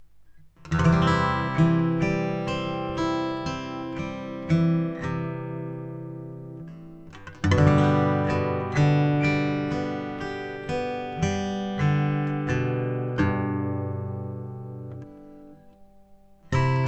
Gibson J-45, guitar

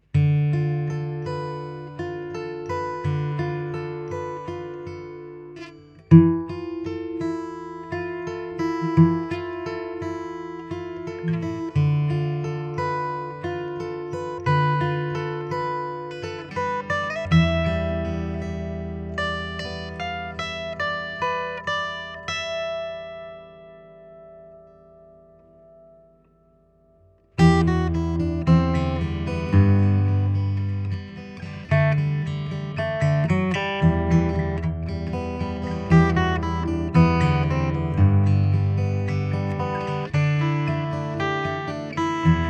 martin d-18v

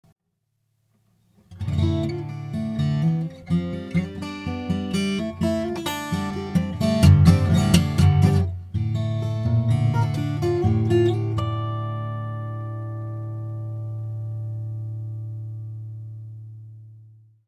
ragamuffin

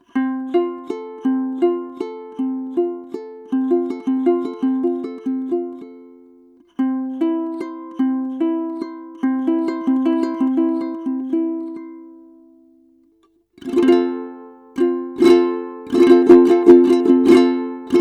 gstring

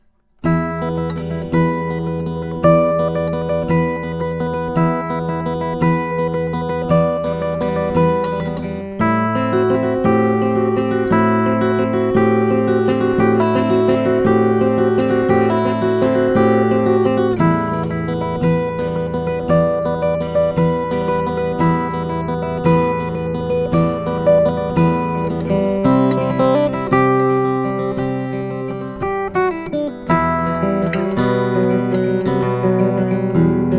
Gibson, guitar

